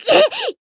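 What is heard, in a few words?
Voice damaged, Japanese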